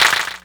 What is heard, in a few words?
Clapbox Pitch 16; Ableton Foundation FreePacks